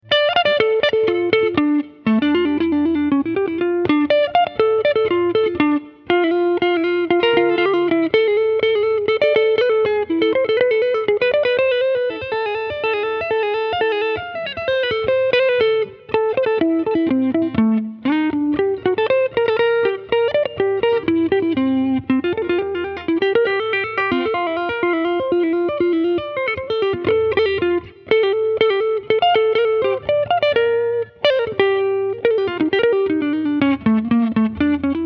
bach gibson

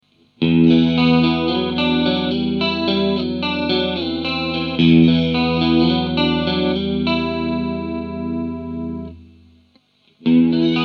joyo dc15 mod fx

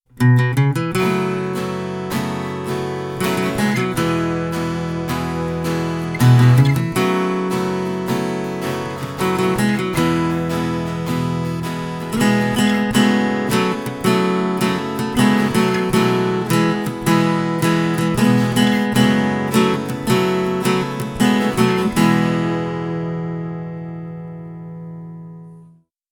crafter castaway mic

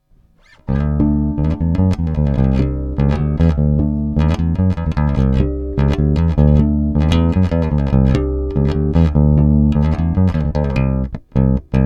fingers; Russtone R5

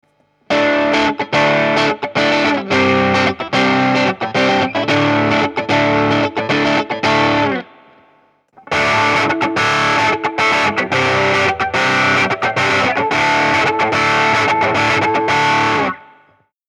low gain; Boost channel low gain; Celestion Rocket 50; MusicMax

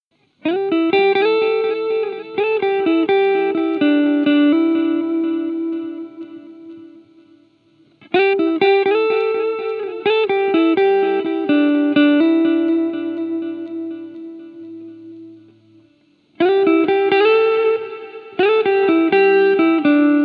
joyo dc15 time fx

